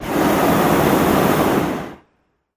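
Hot Air Bllon Burn Cl, Hot Air Balloon Burner, Close; Medium Hard On, Off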